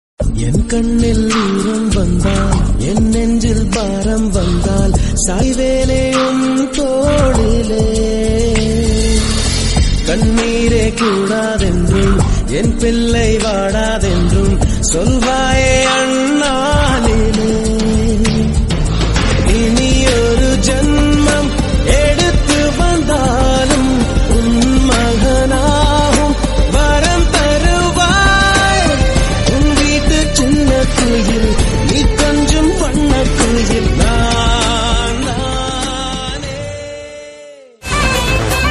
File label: mother love ringtone and bgm